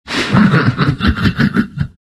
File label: medusa laugh